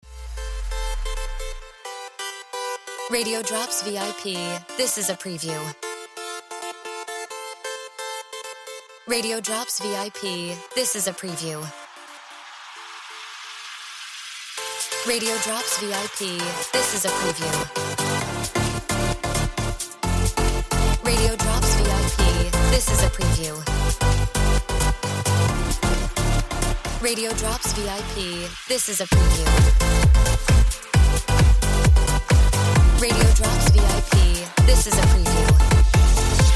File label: Music Beds